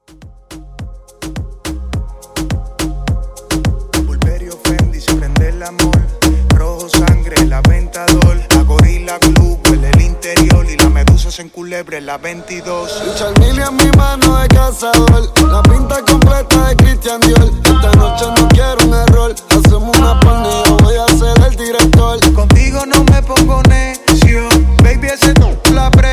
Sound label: Moombah Re Drum 105